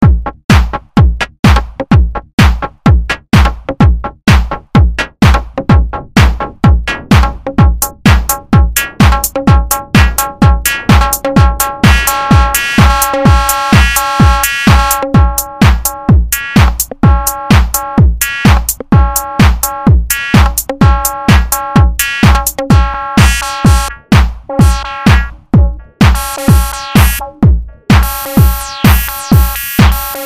bassline pro 4

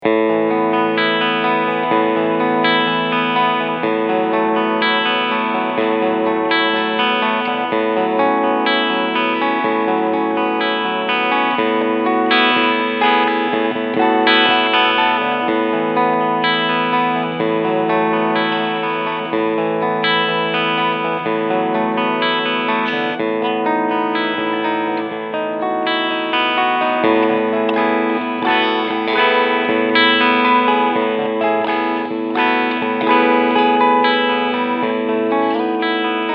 Fender 50s Tele Cleansound Front PU Out of Phase; JV Modified

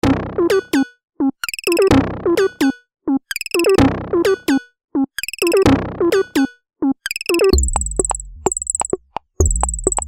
Percussion Sets

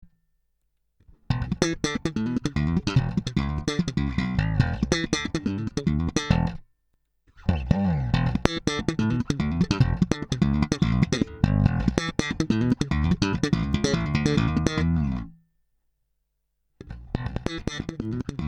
Comp Slap; Dapper

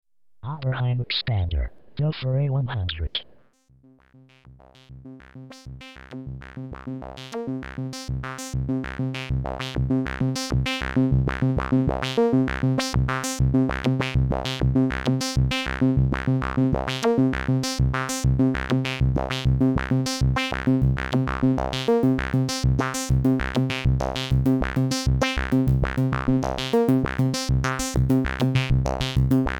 Filter Mod Sequ Xpander Reso

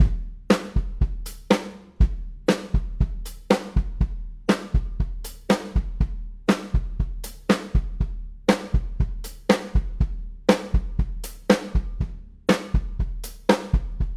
Drums Comp Rev

